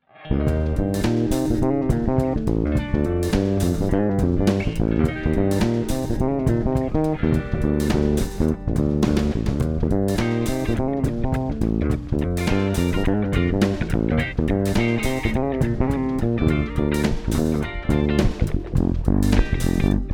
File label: funky